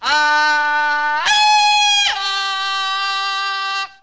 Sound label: Almost Tarzan, Fanfare; 1997 MMX LAN PACK (win 95); Worms Armageddon